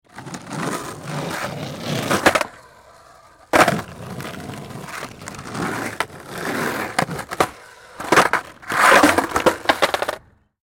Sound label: Skateboard; Big Room Sound